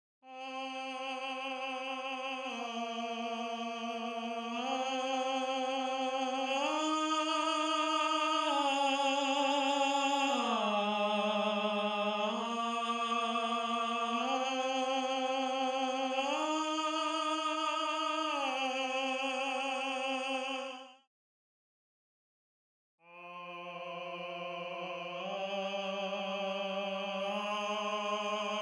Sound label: Tenor, counterpoint